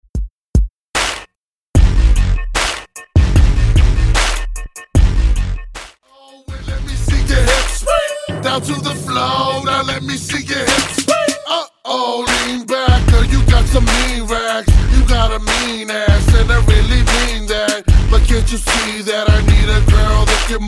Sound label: Swing Extended Redrum Dirty